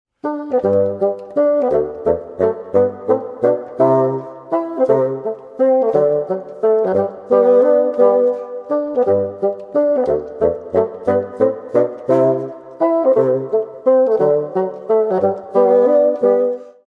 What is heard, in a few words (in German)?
fagottduo, menuet; hofmeister